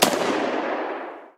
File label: ak47 fire, Guns